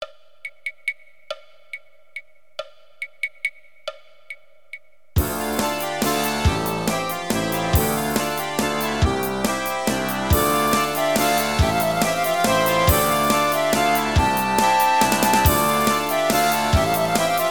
waltz; Alone in the Dark 2

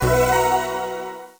Coin; Beastorizer; Arcade

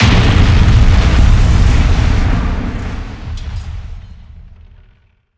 BREAK

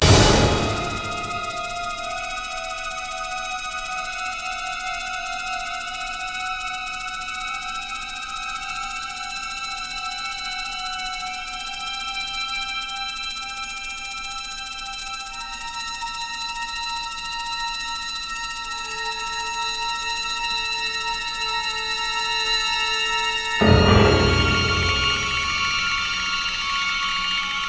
Scary; Dragon's Lair 3D Return to the Lair; GameCube

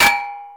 Impact Small Metal Hits

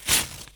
newspaper rip; Plants vs. Zombies

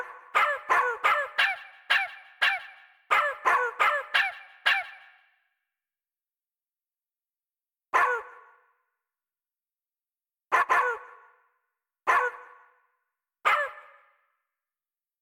Band Dog LOOP; barbie dreamhouse adventures

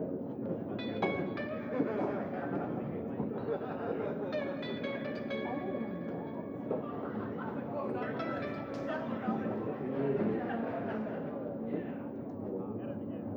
FlowerHouse BGM; Tales of the Neon Sea; iOS PS4 PS5 Switch Windows Xbox One Xbox Series X/S gamerip 2019

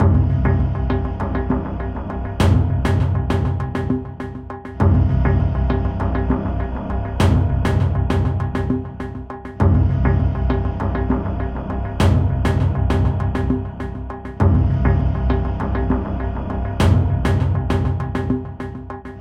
Level Presentation; Militant

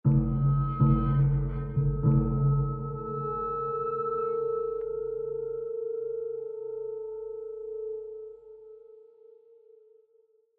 Tension Mood

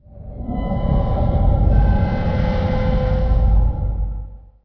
Ambient

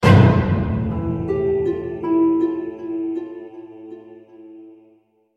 Alert STING + Dark Defeat

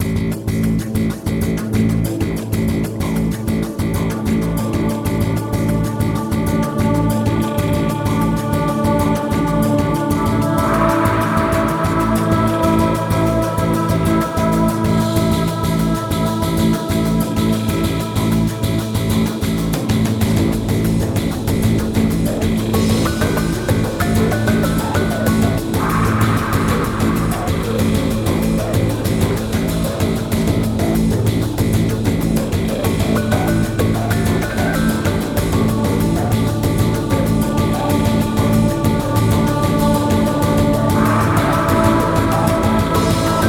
dungeon; ueno; Shin Megami Tensei Imagine gamerip

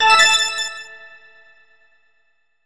Silver Coin; Diddy Kong Racing HD; N64

